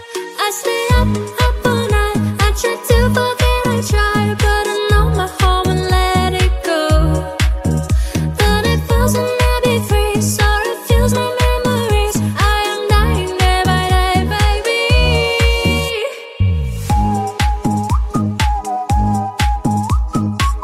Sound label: English Version Scots English